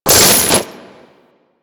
Condemn Chains Beam; Warframe; Warframes; Priest

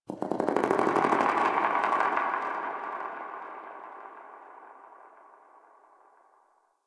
Space Rings Details; Ambience; Exterior; Warframe; Railjack

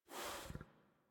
Pet Snort; Enemies; Warframe; Catbrow; PetShip

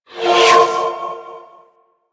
Spirit Primary Fire Whizzby E; Warframe; Grineer Thumper Bot; Sentient Spirits

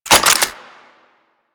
Reload, Weapons; Kraken

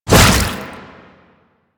Shield Lancer Shield Swing; Warframe; Lasrian; Lasrian Soldiers; Weapons

